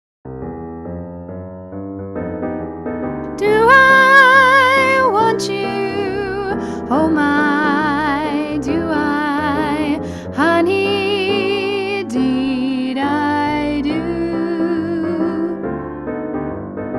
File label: alto